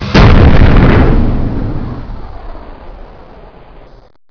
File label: crash; fortress